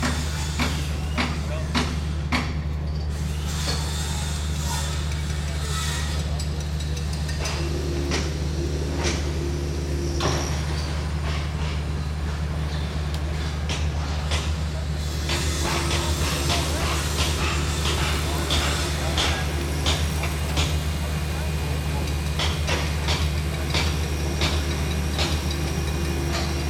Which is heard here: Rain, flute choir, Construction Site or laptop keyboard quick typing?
Construction Site